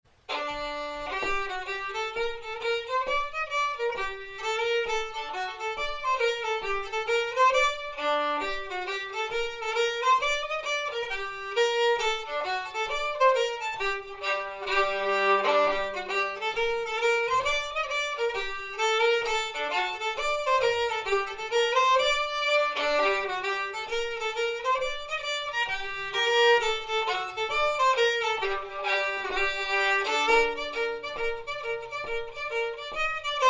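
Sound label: G minor schottis; Midwinter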